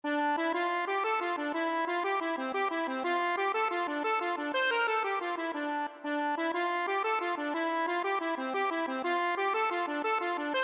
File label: dublin streets, accordion